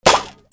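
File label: percussion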